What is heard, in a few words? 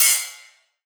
Dubstep Ride; Cymbals; Rides; Drums; One Shots; Cymatics; Dubstep Starter Pack